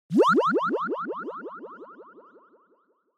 Anime Motion Mid Delay

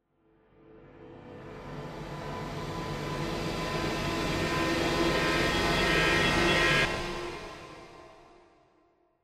Horror Accent Rise, Short

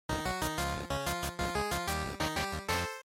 GB Fighting Win

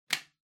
Vacuum Cleaner Switch; 掃除機; スイッチ

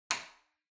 Light Switch Box Rocker Hall Hard

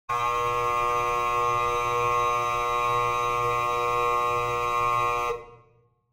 Opening Buzzer

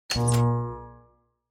TV Turn Off Dry

